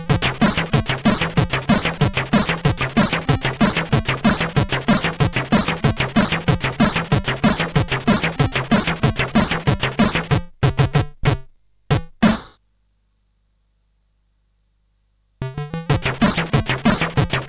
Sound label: tune, CSG8580R5; Aaaargh